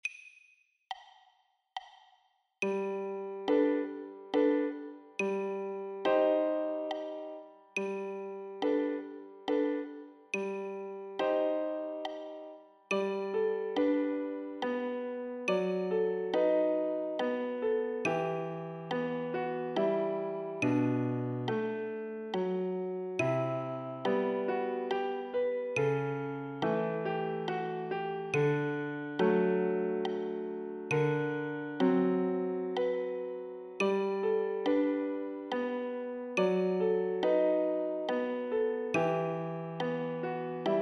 tempo 70

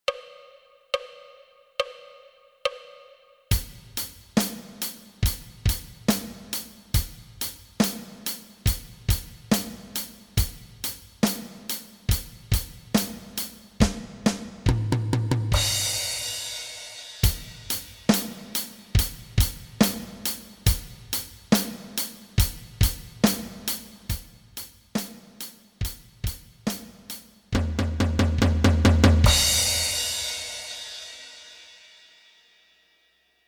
rock 70; Cowes

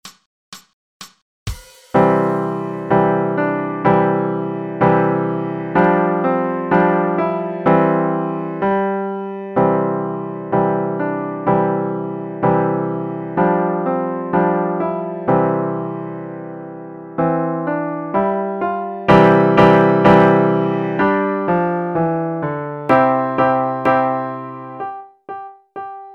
Allegro

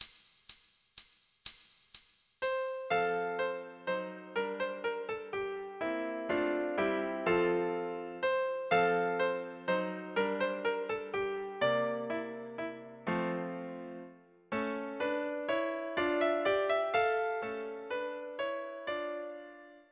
Courtly Dance 124

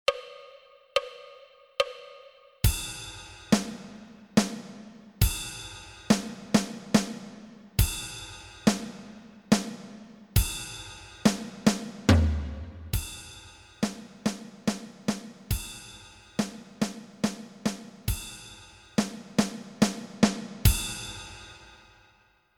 Waltz 110

